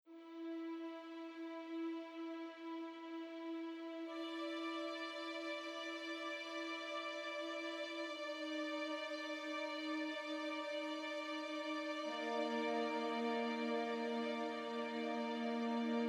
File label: Blood Strings